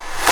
Clap; VEE Clap 116

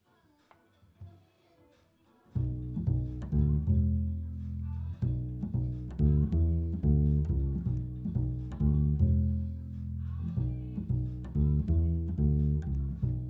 Bass Mic

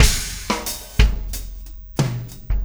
Basic Beat

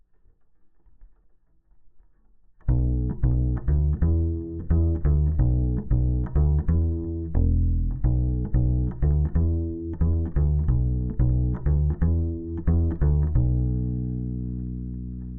Bass DI

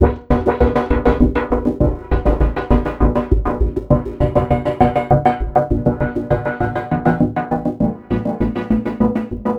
Envelope Beat

